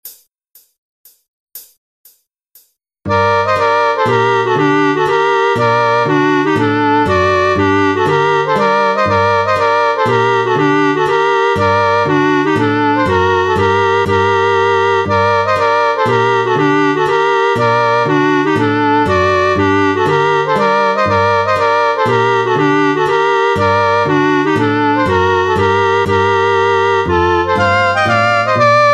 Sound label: Finnish Mazurka